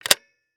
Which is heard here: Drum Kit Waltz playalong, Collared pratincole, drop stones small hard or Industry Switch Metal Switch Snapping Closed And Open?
Industry Switch Metal Switch Snapping Closed And Open